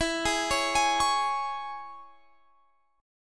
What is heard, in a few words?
Ab Suspended 4th Sharp 5th chord